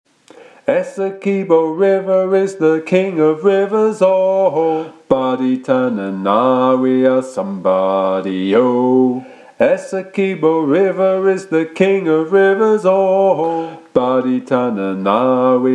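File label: Essequibo River - bass, sea shanty teaching track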